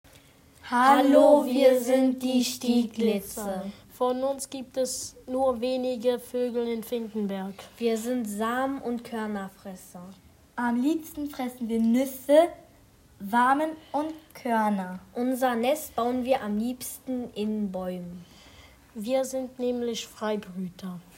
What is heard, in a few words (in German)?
Stieglitze; Voegel